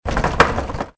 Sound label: door rattle; player; Soldier Of Fortune II Double Helix; XBOX